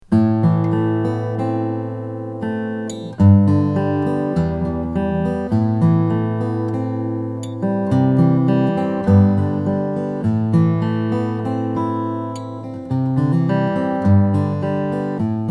doerr legacy select indian rosewood

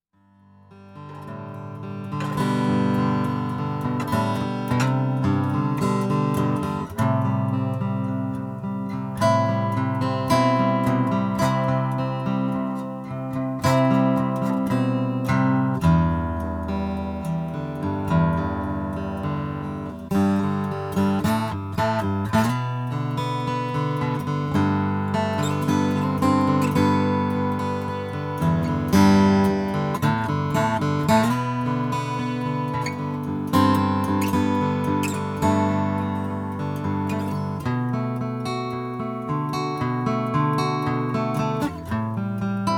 rainsong graphite guitar black ice jumbo

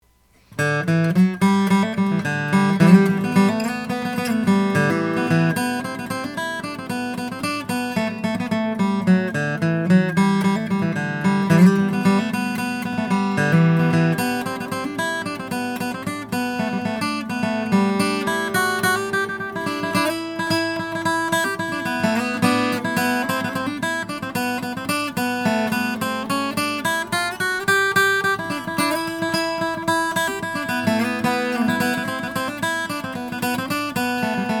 stephen kinnaird fs 12, cocobolo

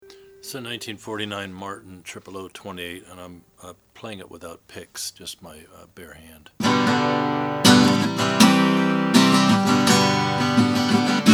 cf martin 000 28 brazilian; natural

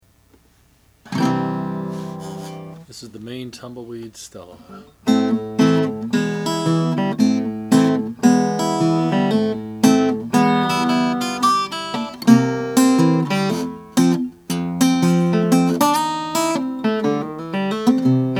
1920 oscar schmidt stella stella natual

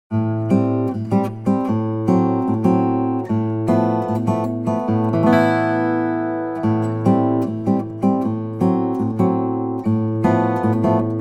webber 00 figured koa; 14 fret slot head 1998

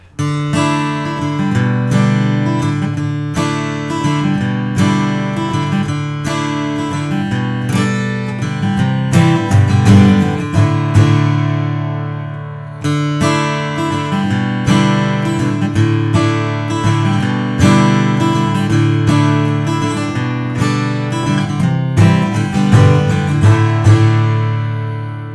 2015 taylor k22ce koa short scal shaded; 12 fret; edgeburst

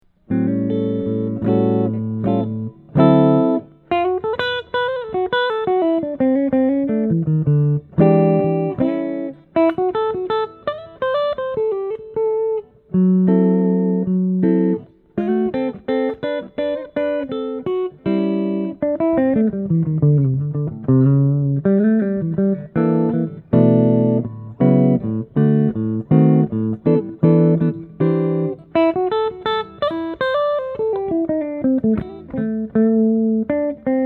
eastman t186 mm custom natural

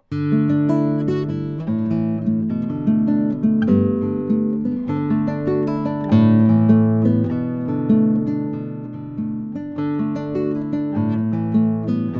1993 martin n 20 indian rosewood

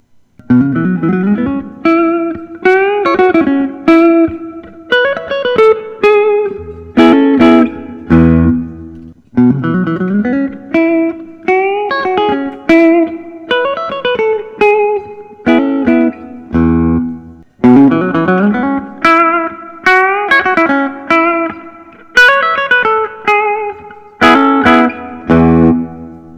sg standard vintage, guitars; gibson custom; vos; 6 6 lbs; cherry

